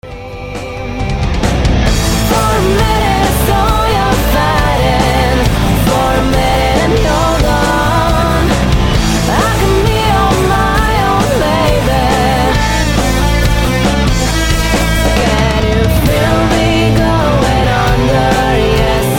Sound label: ring tone